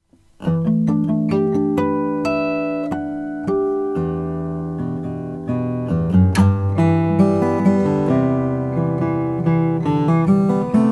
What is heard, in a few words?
collings custom sjc european flame maple